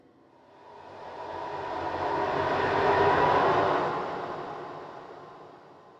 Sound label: echoes; thief 2014 gamerip